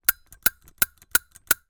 transport bicycle bell ring several times broken

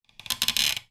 wood squeak creak; foley; zapsplat

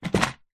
industrial tool box plastic set down on ground

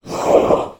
Demon; little robot sound factory

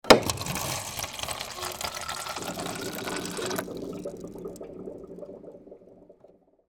office water dispensor dispense into plastic cup

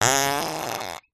cartoon fart

